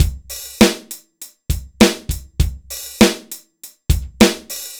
dry loop, BPM100

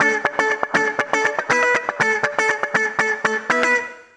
Acoustic Guitar Loops, 120; USB Soundscan vol.04 - Electric & Acoustic Guitar Loops; AKAI

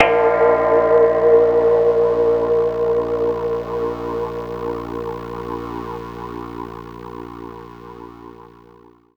Pad

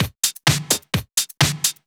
Clubhouse Loop 128 BPM

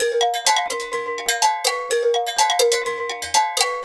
Bell Ensemble Modulated, Percussion Loops

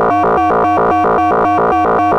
Moog A2, Synthesizer; Hollywood Edge; Giorgio Moroder Rare Synthesizer Collection; MLTI.MOOG

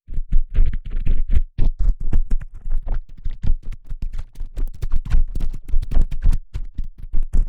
asmr

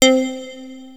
Access Virus B; Synthworx

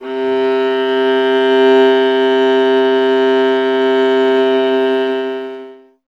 viola C; Miroslav Vitous; Solo Instruments VOL-1

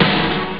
great snare; ST-XX Amiga Soundtracker Sample Packs

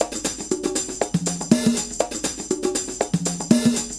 Percussion, R&B Drums

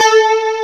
E. PIA A3, Piano

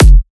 drum kick driven; Virtual Riot; Drum Hits

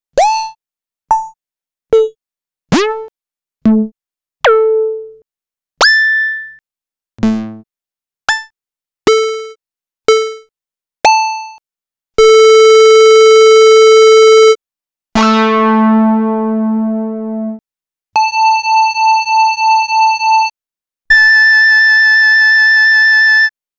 bleeps & blips; X-Static Goldmine; ravers (chains)

